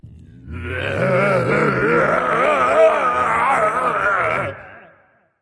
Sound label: fear; chaac